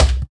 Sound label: Ball Bounce; Hits